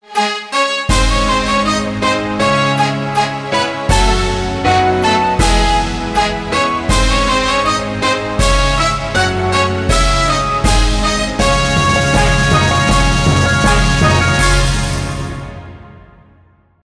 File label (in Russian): marshi i fanfary fanfary; det-skaya-olimpiada